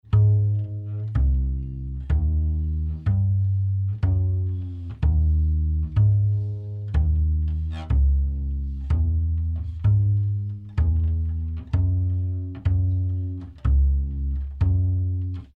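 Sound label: UPRIGHT BASS CLASSIC CHANNEL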